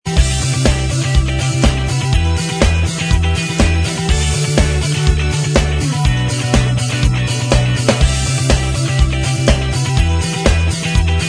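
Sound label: instrumental, backing tracks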